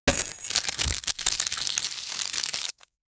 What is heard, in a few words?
rare shiny card comes out; cards